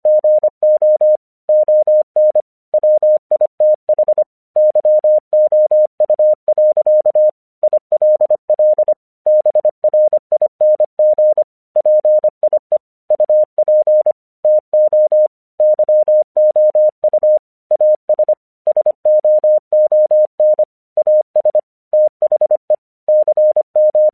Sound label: English 25wpm